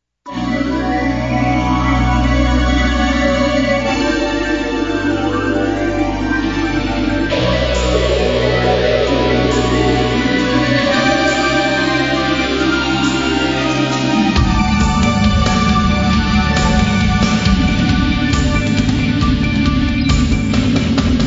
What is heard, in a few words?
bgm